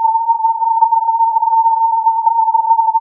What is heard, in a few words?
filtered input